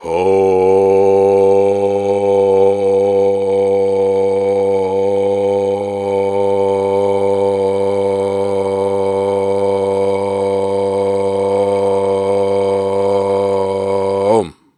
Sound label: Sustains, Viking Vocal